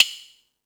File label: Percussion FX, E-MU Pro-Cussion